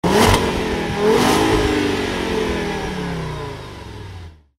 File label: Engine Sound